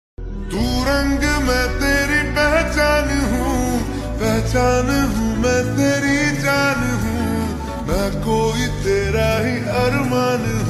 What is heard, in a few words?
Slowed Reverb Ringtone